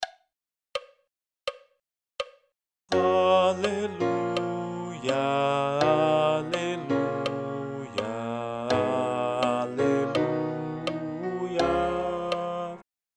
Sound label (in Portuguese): Aclamacao coro; 3o dom